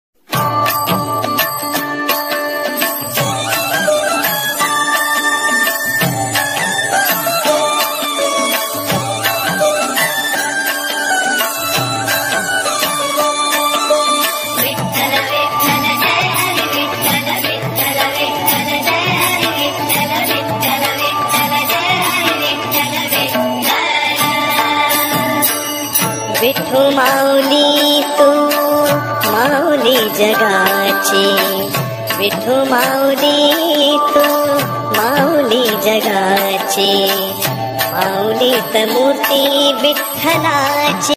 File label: marathi song ringtone marathi bhakti geete bhajan music marathi gani bhajan